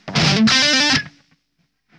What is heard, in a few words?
metal